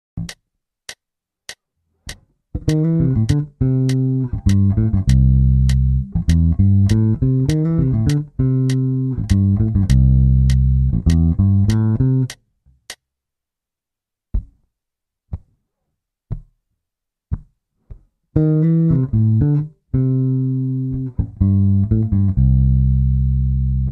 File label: Fill bassline in C major